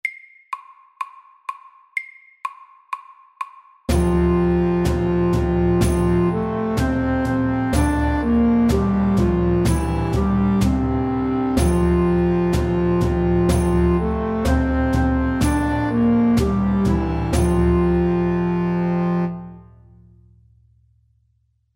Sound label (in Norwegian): Engelsk melodi FORT